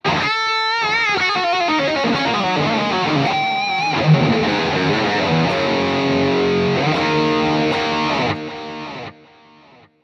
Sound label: Guitar Shred